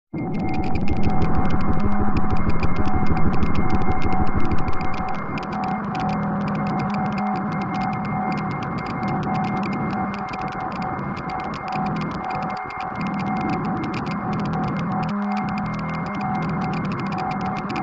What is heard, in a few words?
Noise Phantom Feedba